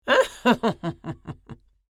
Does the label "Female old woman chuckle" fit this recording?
Yes